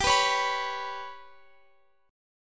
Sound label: G Major Flat 5th G down Guitar Open F; GMb5; strummed